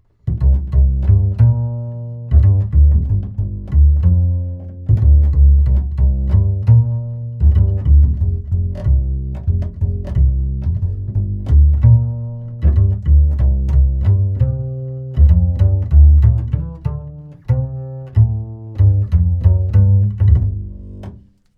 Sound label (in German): Neumann TLM 49 Kontrabaß